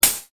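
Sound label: open hi hat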